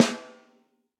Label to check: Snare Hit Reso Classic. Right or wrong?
Right